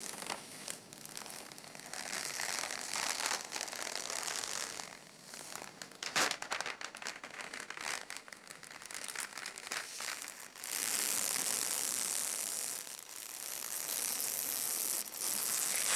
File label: crumpling paper with trickling sand